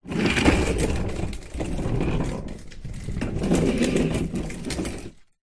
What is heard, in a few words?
household electric lawn mower roll movement on tiled garage floor; zapsplat